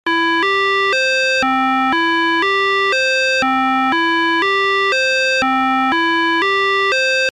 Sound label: fm sound ratio4